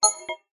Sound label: multimedia notification short digital futuristic beep generic